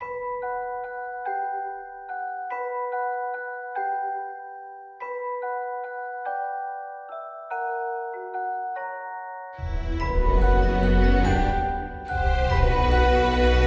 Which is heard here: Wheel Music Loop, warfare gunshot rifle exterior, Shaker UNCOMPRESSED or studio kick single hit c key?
Wheel Music Loop